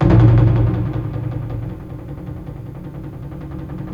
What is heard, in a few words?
A#3, JAPANI PERC; Northstar; The Wizard VOL-1